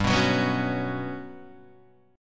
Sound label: G Major 7th Flat 5th G down Guitar Drop D